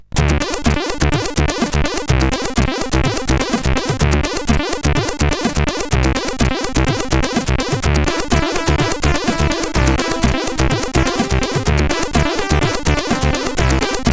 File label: Funkriff, CSG8580R5